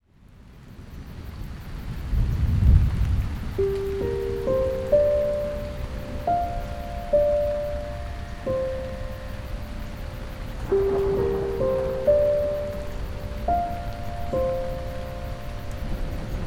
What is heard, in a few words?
The Dark Night (With Rain & Thunder), Piano in Nature